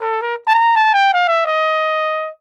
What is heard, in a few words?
flugel lick